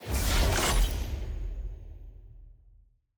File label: loot reveal material key